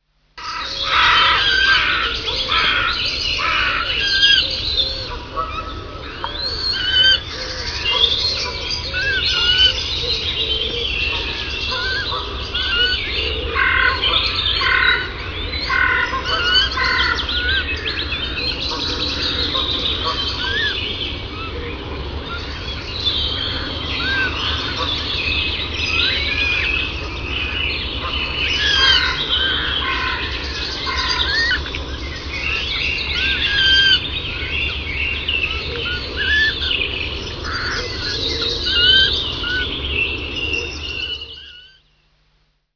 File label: Birds in the woods